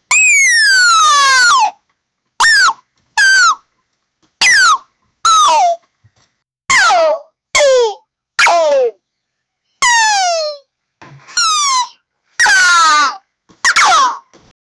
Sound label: human game character, character sounds; human aaa game character